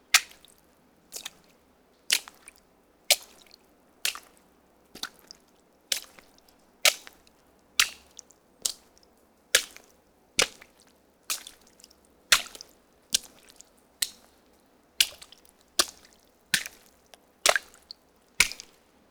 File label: Footsteps Puddle Walking